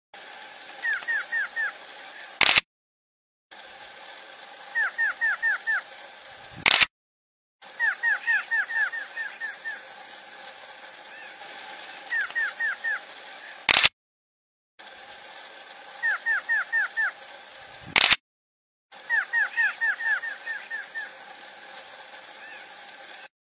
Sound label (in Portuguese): Canto do choca da mata